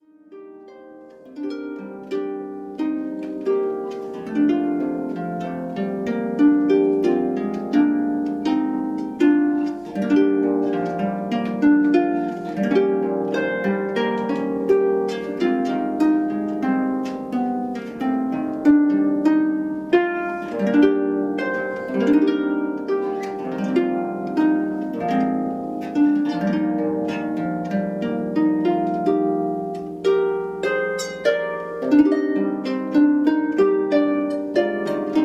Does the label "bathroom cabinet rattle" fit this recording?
No